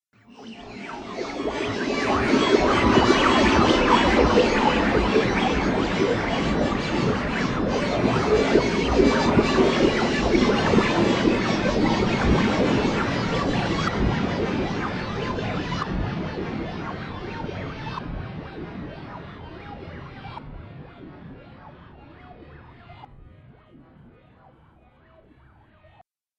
Alien Radio Interference; Alien Sound Effects

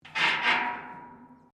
METAL RUSTY SCRAPE; Metal Sound Effects; Metal (Sound Effects:Materials)

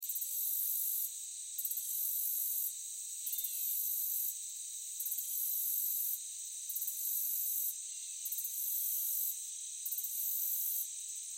Meadow Crickets Birds Loop; Environmental; Nature; Ambience; Blastwave

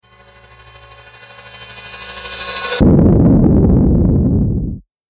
Logo Intro Sound Effect; Sonic Logo Sound Effects

